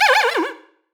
Creature small; Free SFX for anything

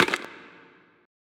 UI Open; FREE SFX PACK VOL 3

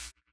Hop; Attacks

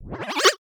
synth misc, retro synth; retro synth SFX

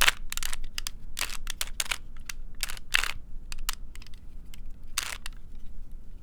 Small Cube - Twist, Rubik's Cube

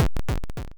square explosion fadeout; Square Waveform sfx

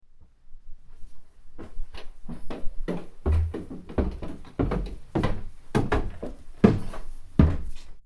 Stair Footsteps; horror sfx vol 2

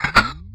Retro Granade Launcher; Weapon

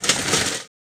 falling, breaking falling hit

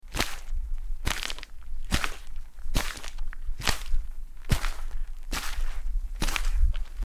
Mud Footsteps; horror; horror sfx vol 2

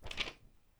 paper

